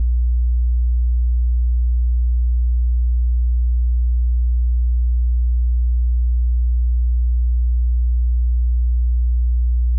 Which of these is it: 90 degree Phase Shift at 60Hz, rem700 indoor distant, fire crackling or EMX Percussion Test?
90 degree Phase Shift at 60Hz